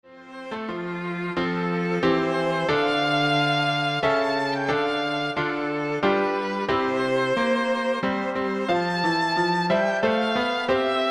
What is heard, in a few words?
Piano Trio